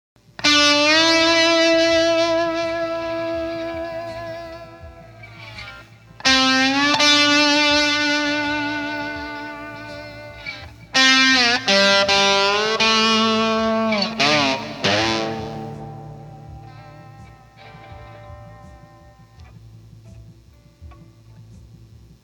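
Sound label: 9v Power Boost, guitar; Std Series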